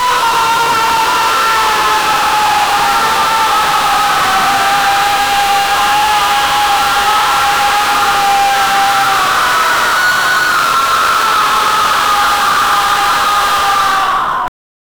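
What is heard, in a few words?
a chaotic roar of countless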